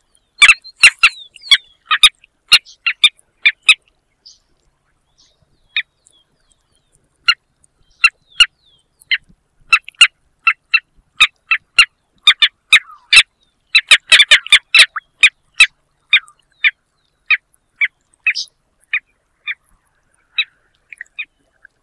gaviotin de cola larga; Sterna hirundinacea

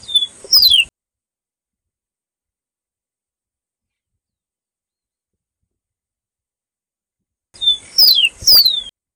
capuchino de pecho blanco

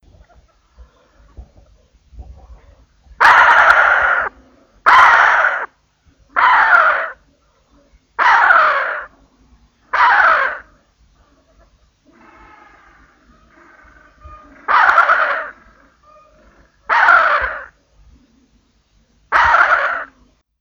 skua antartica, Stercorarius (Catharacta) antarcticus

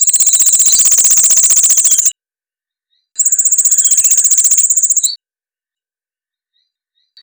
viuva

